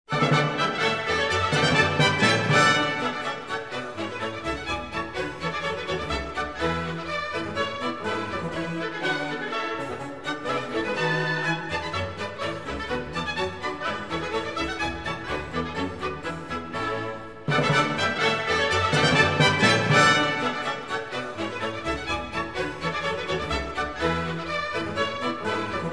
Orchestral Suite; bach; No. 4, Rejouissance

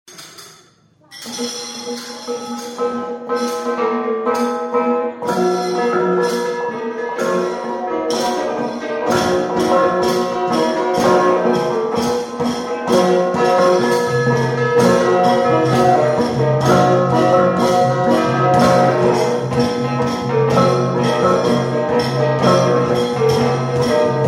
Central Highlands Bronze Gong

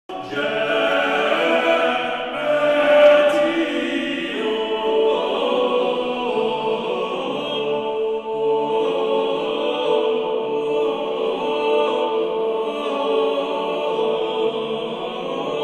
organum quadruplum; Perotinus; Viderunt omnes

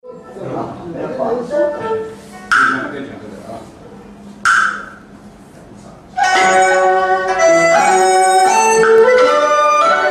Chinese Silk And Bamboo

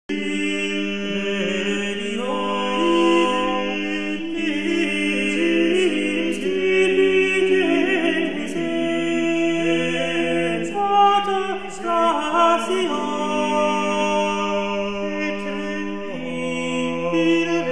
motet; Philippe de Vitry; In arboris-Tuba sacre fidei-Virgo sum

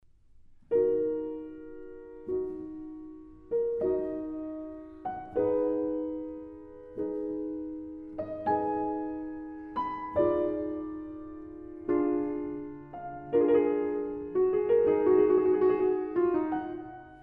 Prelude and Fugue in E-flat Minor; bach; D-sharp Minor

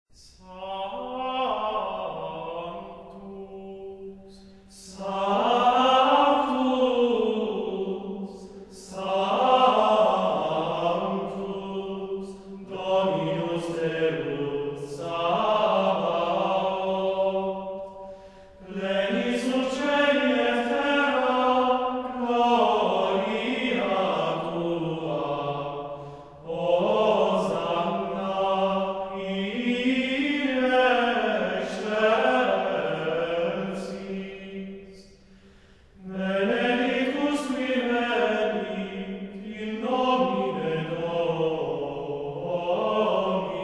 Gregorian chant Mass, Sanctus; Mass for Christmas Day

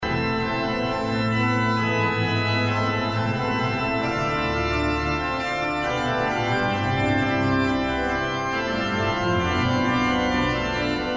Prelude and Fugue in A minor; J. S. Bach

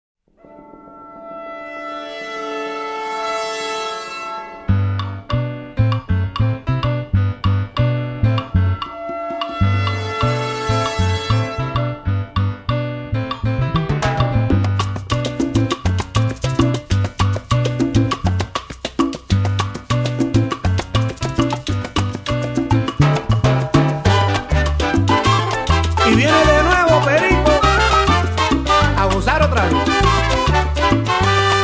Salsa

